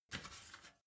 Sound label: step ladder metal soft single; schritte